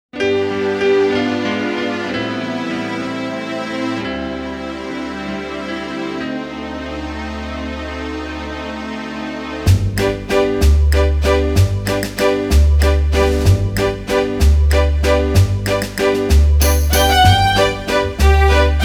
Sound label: Lyrical Waltz; Tick Tack Deluxe